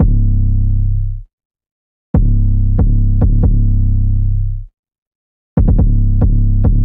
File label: 808 Bass C 70 bpm, Trap Drums; Traverse; Trap Drums by Sound Oracle; Ableton; Factory Packs